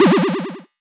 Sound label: Synth, DnB One Shots